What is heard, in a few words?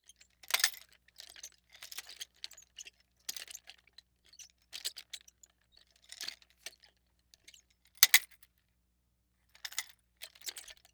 Sports Nunchaku Spin Around